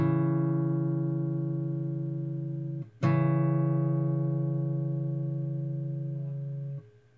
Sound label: interval dm, guitar